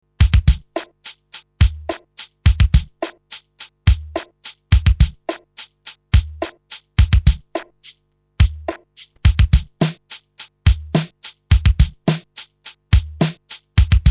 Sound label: Drumstar 80 Downbeat